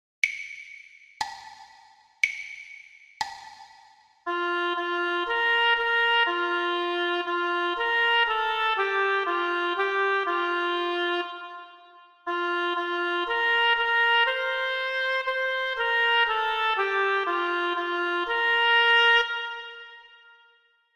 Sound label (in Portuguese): percepcao ditado